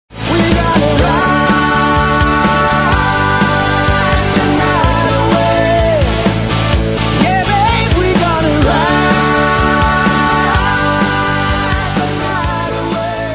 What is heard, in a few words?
ride